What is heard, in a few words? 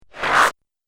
Laser Suck; multimedia